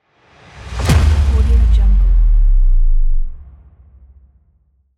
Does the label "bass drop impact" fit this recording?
Yes